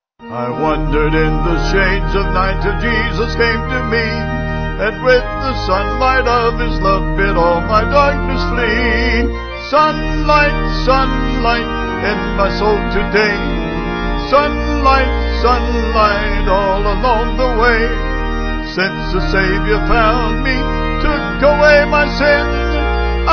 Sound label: Vocal Organ